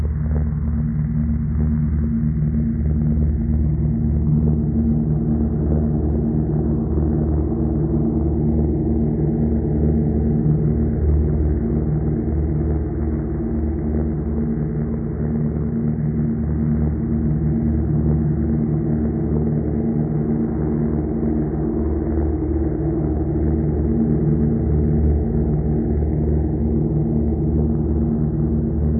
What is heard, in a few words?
Broken Signal Loop, Horror Atmospheres Music; HauntedBloodlines